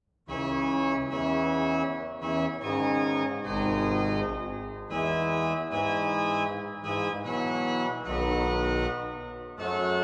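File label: postlude in c minor, organist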